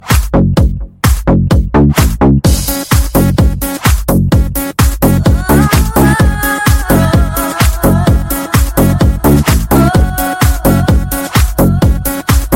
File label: electro ringtone